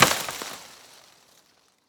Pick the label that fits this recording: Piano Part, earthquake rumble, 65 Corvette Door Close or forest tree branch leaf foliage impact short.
forest tree branch leaf foliage impact short